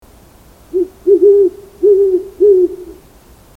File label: owl hooting